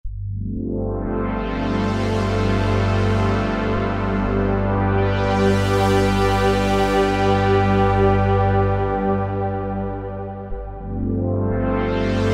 Roland V-Synth; Factory Patches; 355 eVangelic

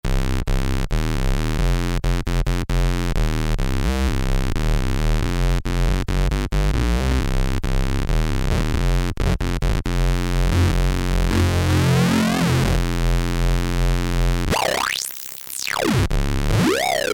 Mango Bass; Novation XioSynth 25

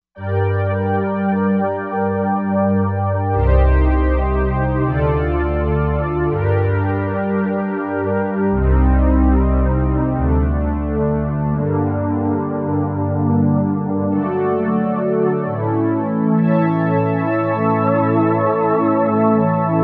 St Analog Str

